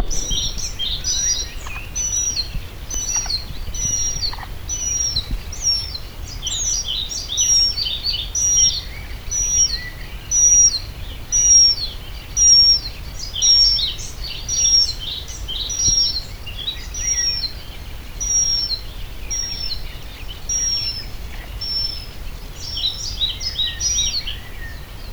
barna retiheja hangja

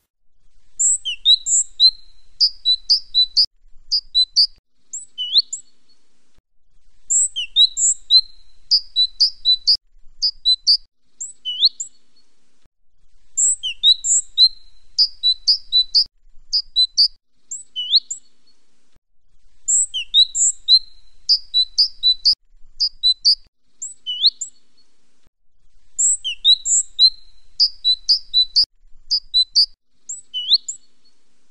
szencinege hangja

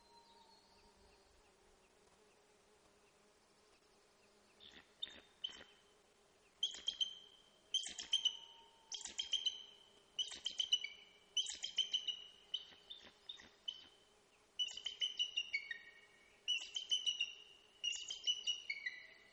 feherfeju retisas hangja

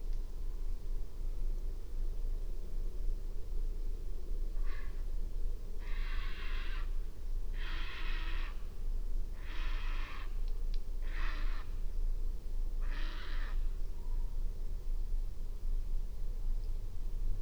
szurke gem hangja

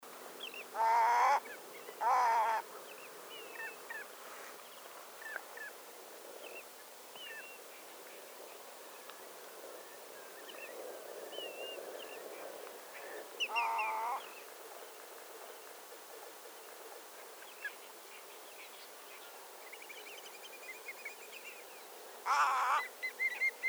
zátonykócsag hangja

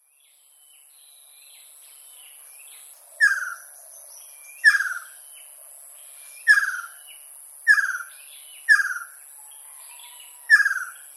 tüzes halkapó hangja

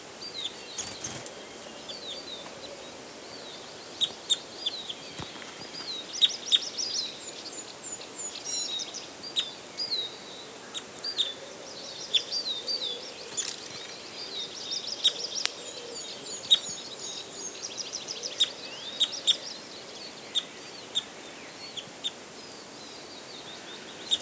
nagy keresztcsoru hangja

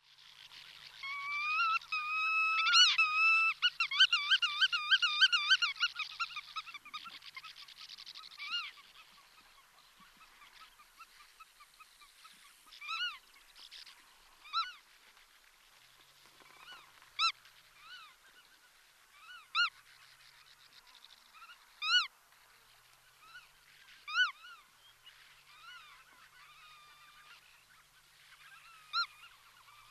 nagy goda hangja

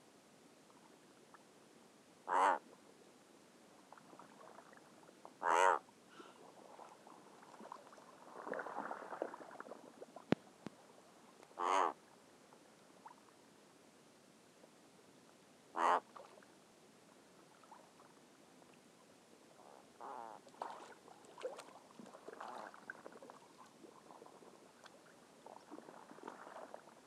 alka hangja

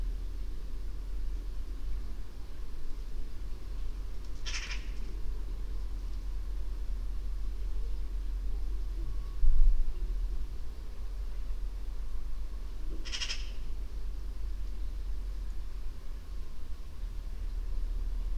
szarka hangja

